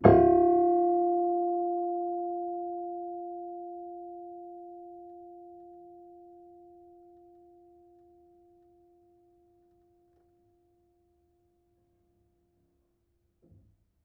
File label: Harm Low, Piano Harmonics